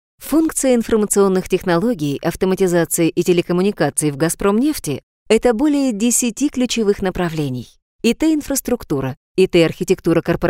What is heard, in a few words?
funk